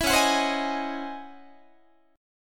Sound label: Db-Minor Major 11th Guitar, DbmM11 chord; strummed; JGuitar